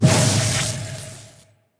Lightning Spell Cancel; AudioWizards

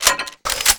Gun Reload Weapon, Weapons Foley; SFX Kit

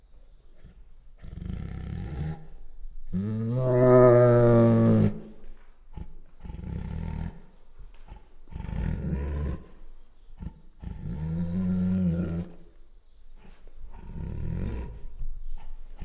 Female Bengal Tiger Soft Growls; Sound Ideas

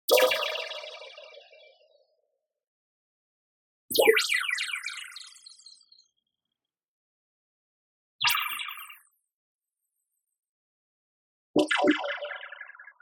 ZAP Ultra High Glisten; boom library; Anime Essentials

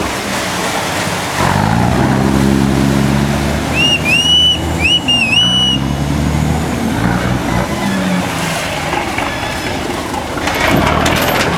harbour ferry leave, sea traffic; best service Blue Box

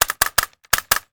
Staple Gun 5x; Tools; 1001 Sound Effects

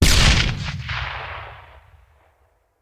Grenade Hand; Sound Ideas; Guns

